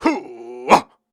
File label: Grunts and Chants, Viking Vocal